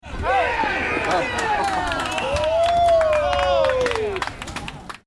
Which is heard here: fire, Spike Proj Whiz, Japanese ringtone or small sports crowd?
small sports crowd